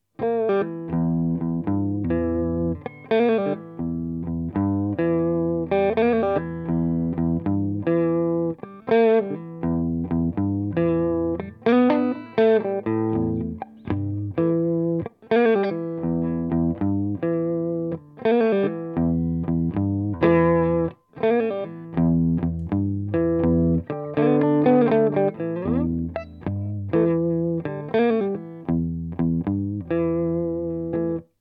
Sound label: blues